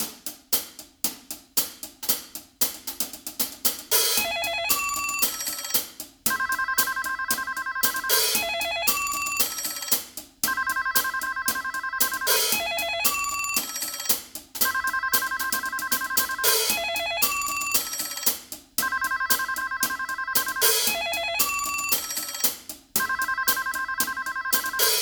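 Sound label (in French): Dring dring play-back sans claps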